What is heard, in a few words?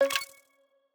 item toggle on